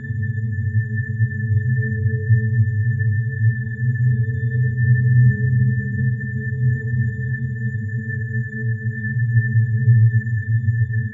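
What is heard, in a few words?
Ambience Sci-fi Energy Loop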